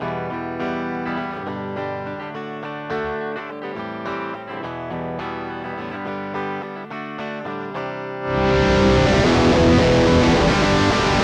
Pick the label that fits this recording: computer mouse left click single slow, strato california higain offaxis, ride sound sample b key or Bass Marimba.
strato california higain offaxis